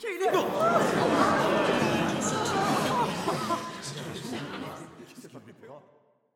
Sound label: crowd Reaction shrine big Reveal; spellbound